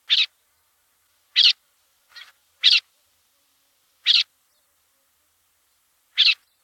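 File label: lesser grey shrike voice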